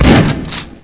SHOOT; CHASM

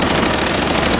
machine rhythem; environ; Gunlok

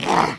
attack; allods2d

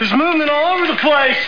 movement

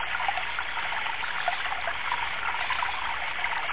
Riverbed; Safari

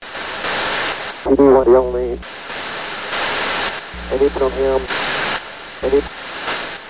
tune

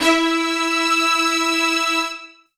Strings C E4; Cyclick Seduction; Kit C

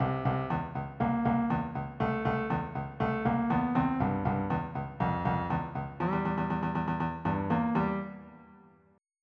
fill; Gold Medal Software Volume 1; music

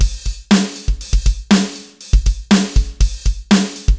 Drum Loop 120; Metro 4.5; Drum Sounds

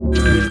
menu out; SINDEMO

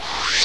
Transport; DragonMaze™ v2.7 Demo

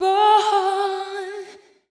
VOICE GRL HOUSE 125 A, House Voice

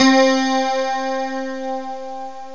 steel-string

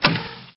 bow shot; DAoC

